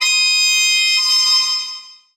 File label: Transition Bell Hit; Adobe; Production Element